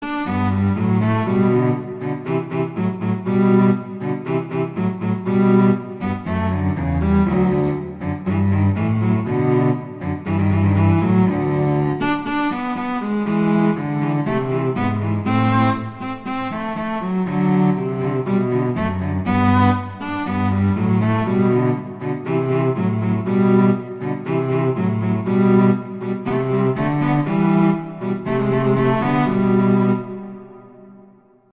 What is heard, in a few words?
Duet; Mozart; Duets